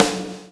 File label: PULL, Backbeat; Spectrasonics - Backbeat